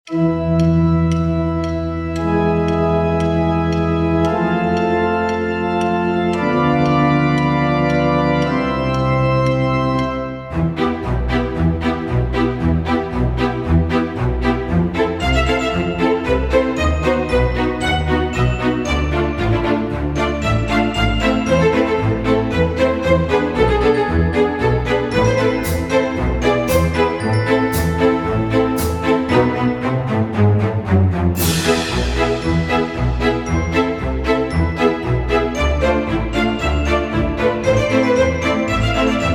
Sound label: skeleton waltz